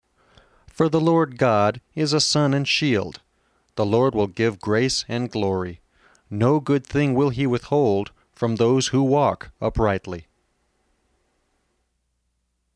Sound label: music english